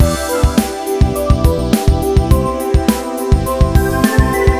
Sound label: loop